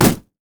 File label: red minion mech basic attack; LoL